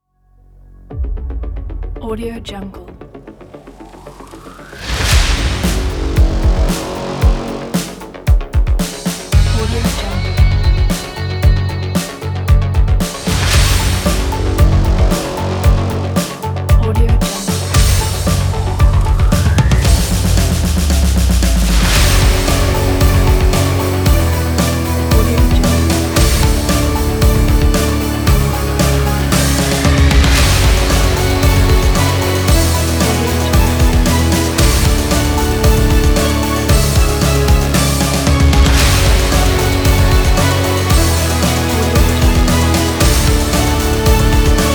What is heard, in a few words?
epic trailer